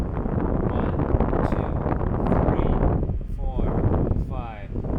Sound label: reference wind noise; knowles